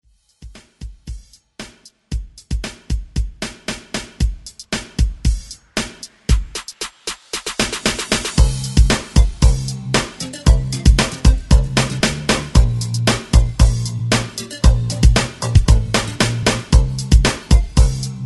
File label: ReDrum 115 BPM